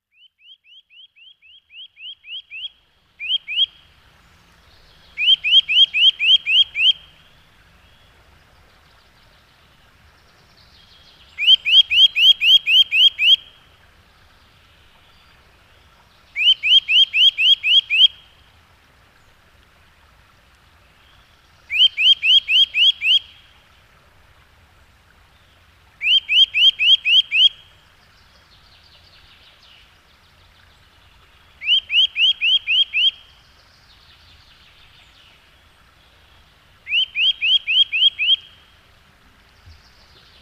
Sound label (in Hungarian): Csuszka